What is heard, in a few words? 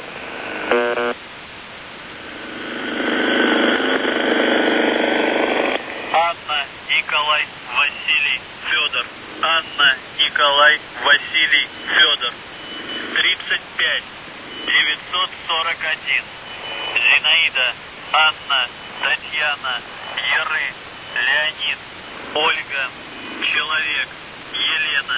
buzzer usb msg